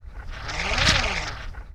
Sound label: Overhead Fly, Sound Design - Sci-Fi; Sony Pictures Sound Effects Series Volumes 1-10; Sony Creative Software